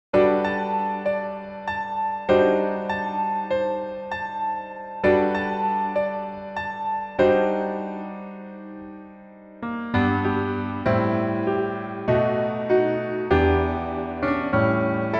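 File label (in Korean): Piano version 멜로디MR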